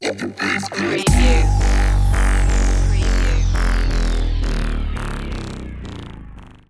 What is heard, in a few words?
subharmonic panic stinger